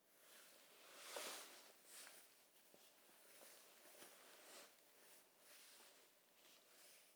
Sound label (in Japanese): 衣擦れ背後からやや右へ